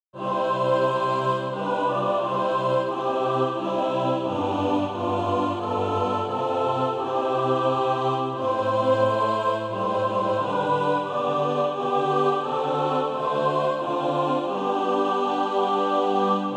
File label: mixed